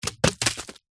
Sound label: foley bark piece fall off tree trunk hit ground; zapsplat